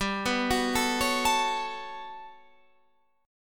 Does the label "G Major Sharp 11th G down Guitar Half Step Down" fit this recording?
Yes